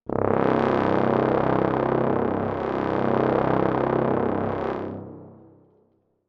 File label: Dramatic Brass